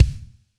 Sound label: marimba drum; ゆめ2っき